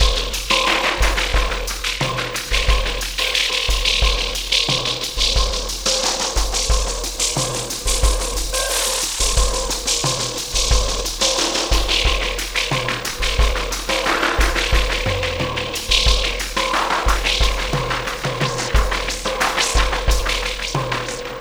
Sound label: Ripple Perc